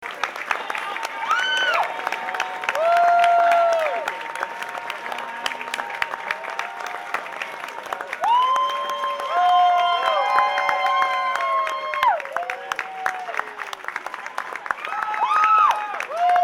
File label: clapping for encore (live); Boston MA